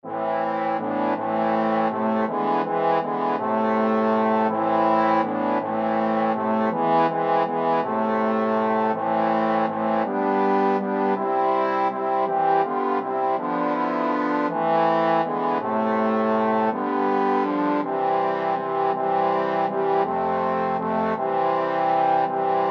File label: trombone quartet